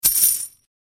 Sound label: AddCoin; Shengxiao